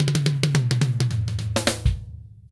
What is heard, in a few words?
FILL, Funky Ass Loops